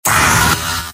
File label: pain sharp; demoman; video games; source engine; tf; mvm